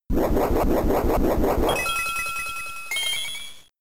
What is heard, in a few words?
In-Battle Held Item Activate